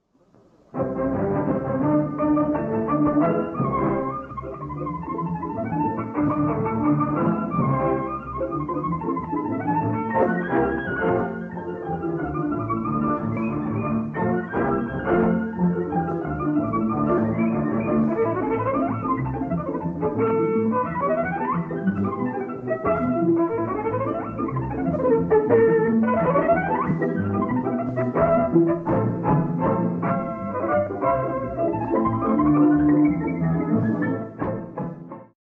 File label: Ziegeunerweisen, Violin, LIVE RECORDINGS; SARASATE